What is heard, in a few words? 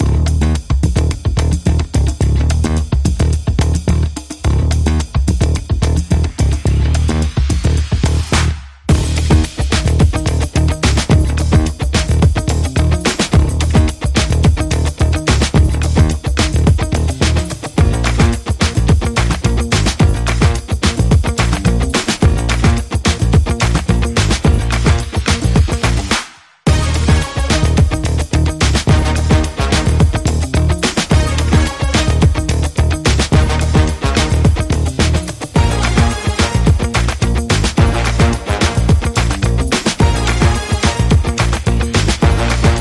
Swing